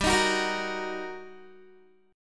G Minor Major 7th Sharp 5th, G, down, Guitar, 4E 4A 5D 5G 5C 6F; GmM7#5; strummed